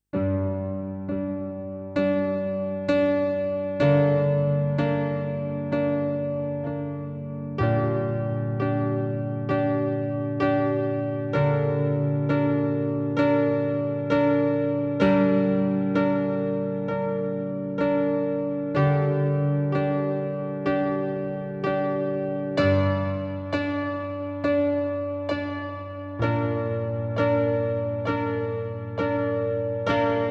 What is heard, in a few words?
Rhythm